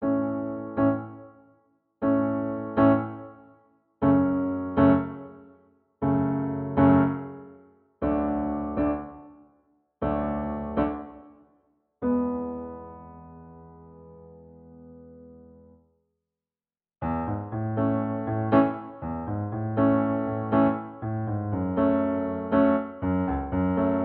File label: I vi IV V Piano A